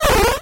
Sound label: squawk; Dino Run